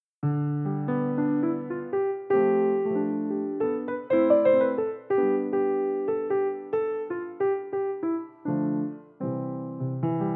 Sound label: Nightly Piano